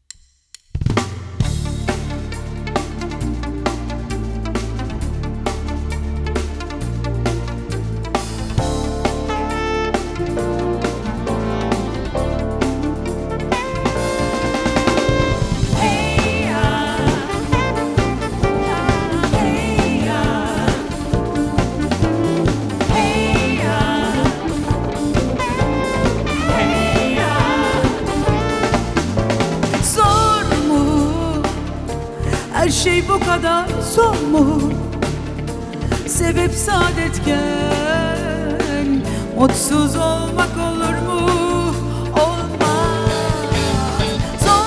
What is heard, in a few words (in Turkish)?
Zor Mu Akustik